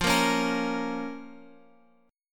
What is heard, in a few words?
F Suspended 4th Sharp 5th F down Guitar Open F; Fsus4#5 strummed; JGuitar